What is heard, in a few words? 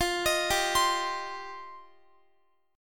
F 7th Suspended 2nd F down Guitar